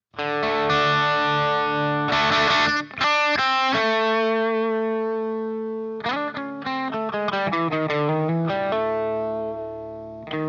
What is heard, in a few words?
Harley Benton DNAfx GiT Rock Crunch